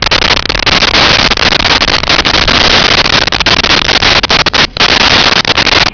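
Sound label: ambience volcano loop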